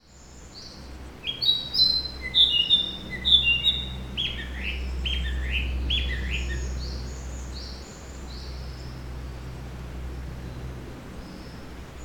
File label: Black yellow Flycatcher mimic